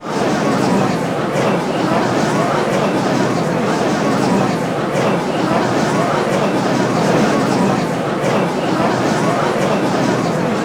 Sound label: gallery